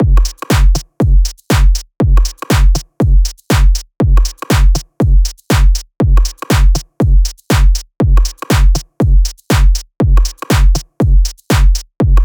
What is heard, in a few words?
drums 120, Full Drum Loops; Slate; SEISMIC Drum Sample Pack